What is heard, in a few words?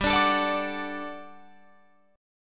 G Major 7th Suspended 2nd G down Guitar Open F; GM7sus2 strummed